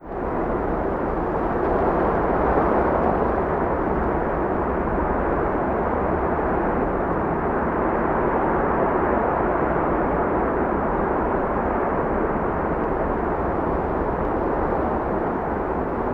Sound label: Meteor Wind